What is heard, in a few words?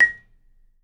A#5, Percussion & Harp; Miroslav Vitous - Percussion & Harp